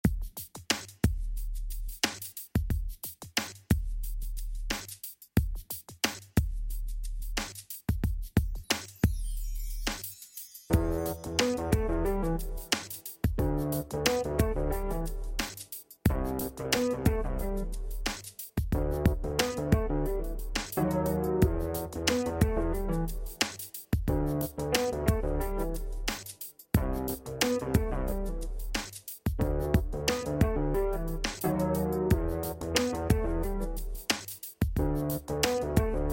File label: dubstep; music-dance